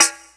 perc, Percussion; Trance; Drums; Trance Explosion Vol1

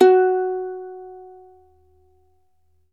uke F#3

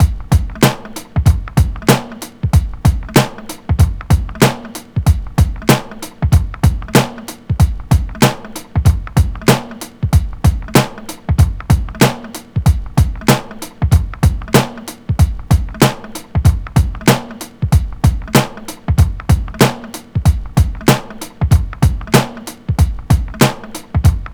94.8 Perky Funky, Breaks; Lockdown Breaks

